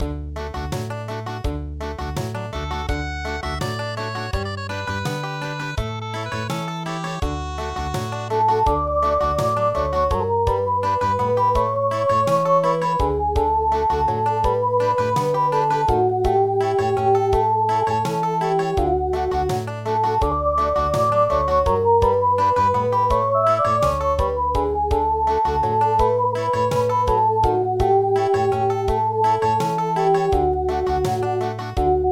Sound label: Country, midi